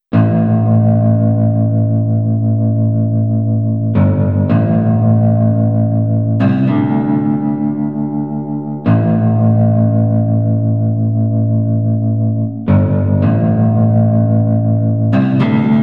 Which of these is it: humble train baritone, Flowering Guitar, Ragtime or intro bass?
humble train baritone